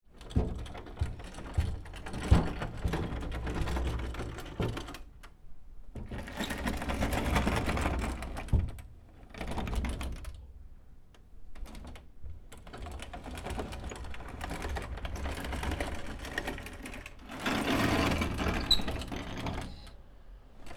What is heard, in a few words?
sliding door glass wooden frame; spoteffects